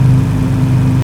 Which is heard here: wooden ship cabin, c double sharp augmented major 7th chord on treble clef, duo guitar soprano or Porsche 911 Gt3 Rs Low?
Porsche 911 Gt3 Rs Low